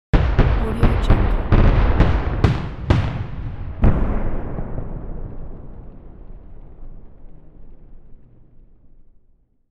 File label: distant fireworks